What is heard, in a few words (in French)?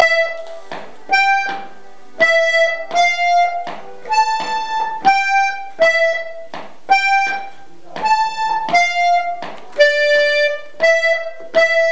Hanter dro Andro 2eme voix 2eme partie; rencontres-2014; Enregistrements St Maurin; Le bel ouvrage